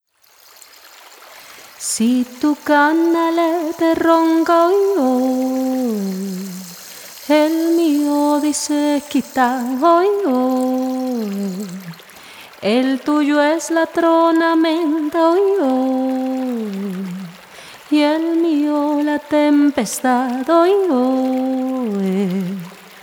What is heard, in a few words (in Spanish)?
Canto de boga